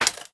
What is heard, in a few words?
clap; smite; arthur skin9